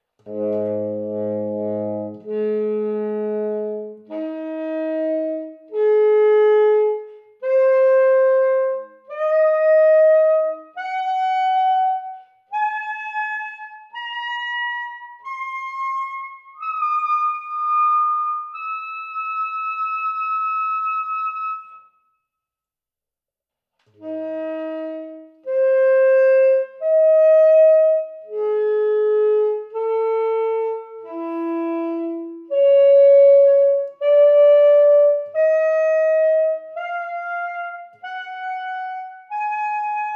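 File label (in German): ten, Flageoletts, Obertonreihe vom tiefsten Ton b (a bei Bariton) nach oben gespielt; Obertonspiel mit wechselnden Grundtönen. Tenor; saxophon; spieltechniken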